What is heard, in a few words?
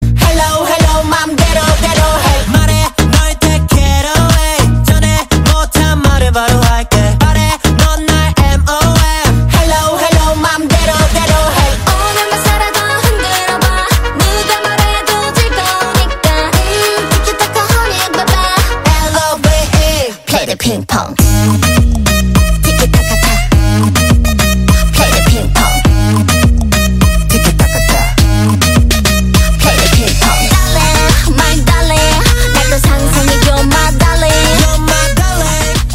PING PONG